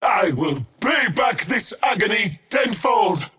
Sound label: skywrath crown attack pain; skywrath mage